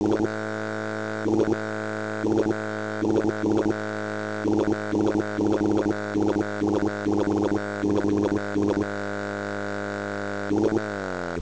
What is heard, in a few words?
Motor